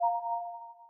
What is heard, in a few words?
Message Out; Gizmo; interface sounds